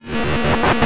Science Fiction Sci-Fi Electronic Pulsing Distortion